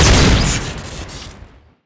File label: Wood Cutter Fire Crit, weapons; tf2classic